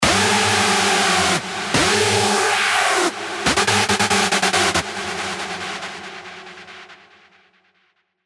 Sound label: Hoover, Serum 2 screech; Hoover Conspirator; Revealed Serum 2 Screech Pathogen